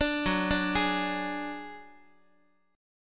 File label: F sharp Suspended 2nd Sharp 5th, Guitar; arpeggiated; down; 4A,4E,5A,5D,5Gsharp,6Csharp